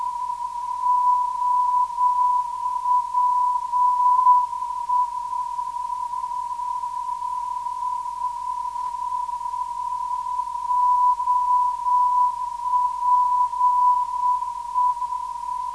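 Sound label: 349kHz, non-directional beacon; OPE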